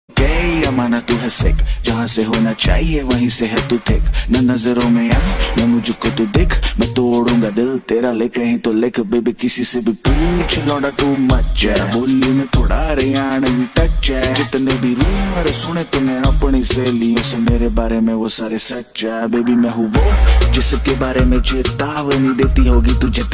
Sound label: Punjabi